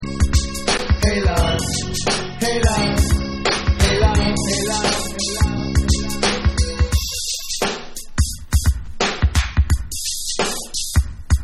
Instrumental

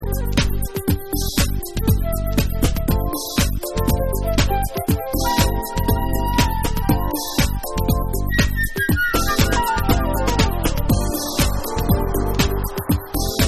Flute Mix

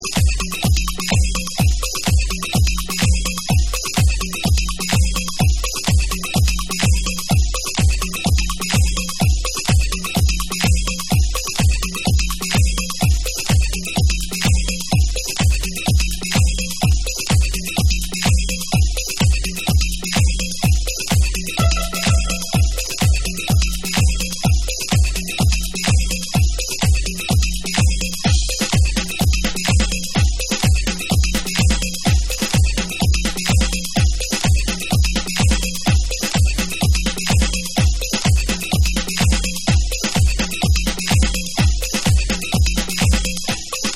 SOUL Beat

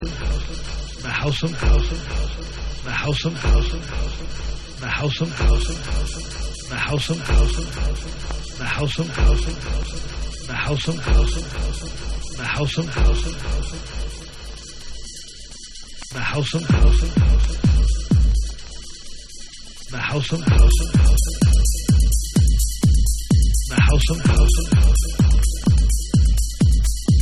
HOUSE Bonus Beats

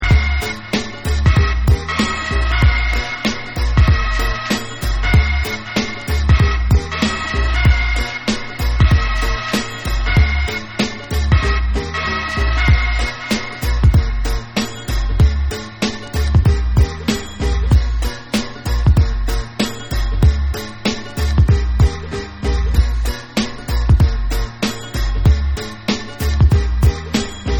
ROCK Instrumental

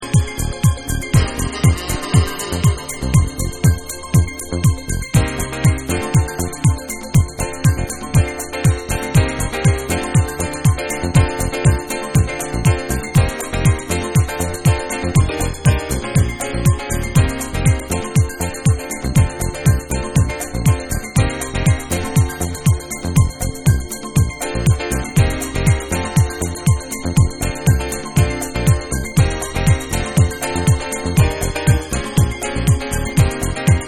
SAX AMBIENT

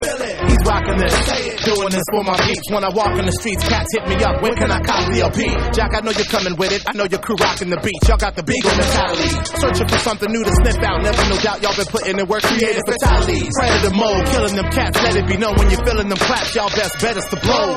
Vocal

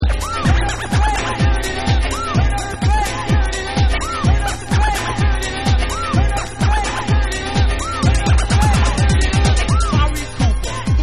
Scratched

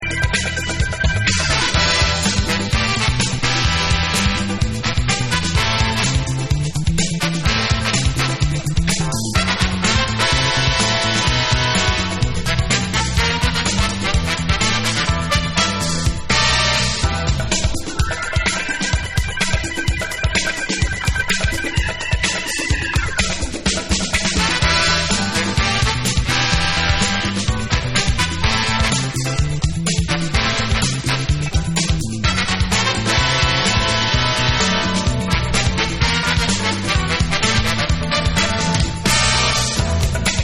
ACID JAZZ

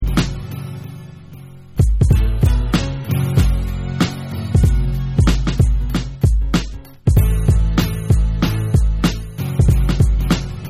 Beat

